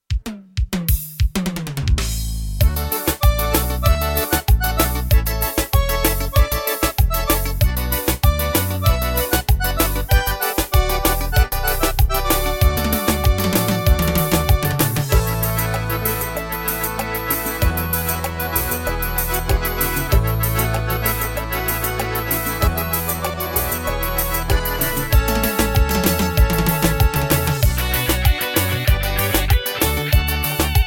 ritmo rasta chinela, korg pa500

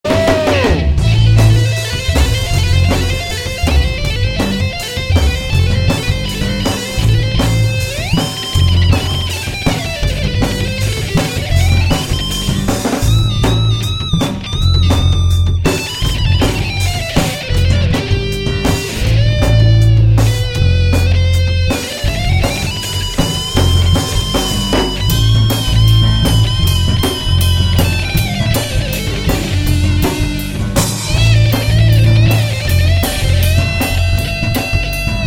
solo de aguas profundas

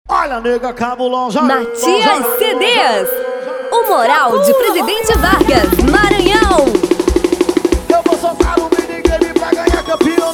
forró

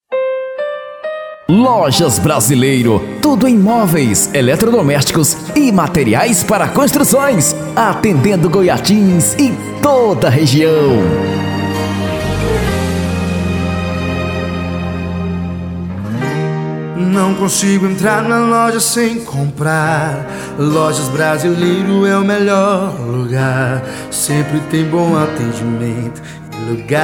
jingle brasileiro

